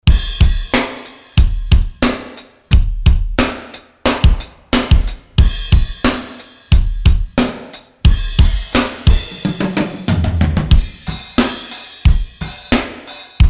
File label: Drum Mix Sample